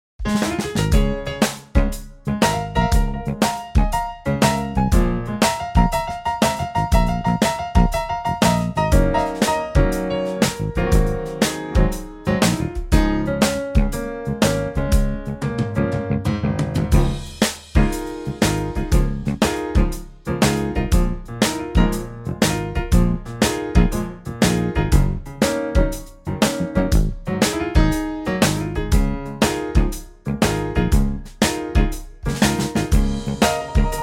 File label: Db swing